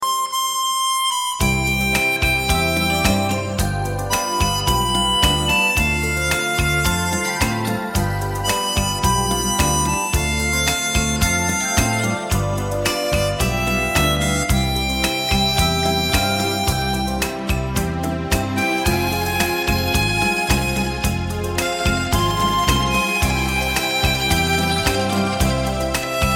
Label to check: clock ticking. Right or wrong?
Wrong